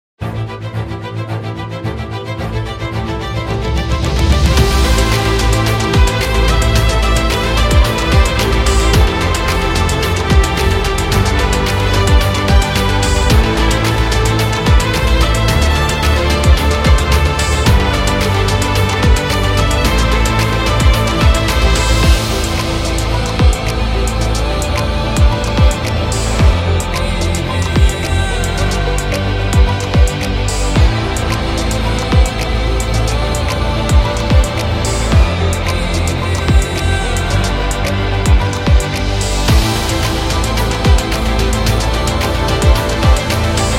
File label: Inspiring Violin